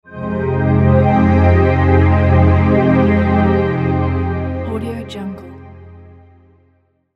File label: pad transition